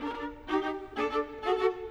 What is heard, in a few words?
Violas, Orchestral; Sonic Foundry (Sony Creative Software) - Orchestral VOL-2